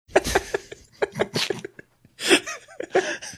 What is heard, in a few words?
laughing snickering